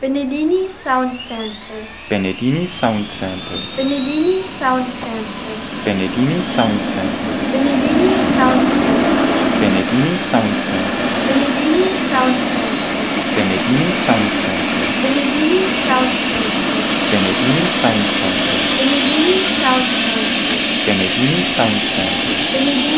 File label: CH53